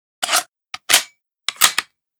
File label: thompson Reload; Modern Weapons Pack